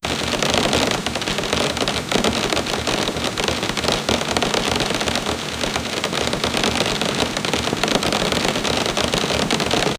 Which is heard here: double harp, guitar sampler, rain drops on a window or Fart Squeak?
rain drops on a window